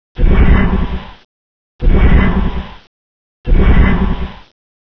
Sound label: big low engine